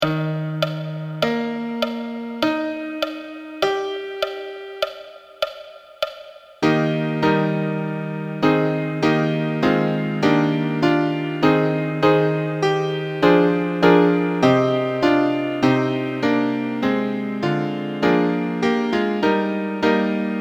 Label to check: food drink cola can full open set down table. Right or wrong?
Wrong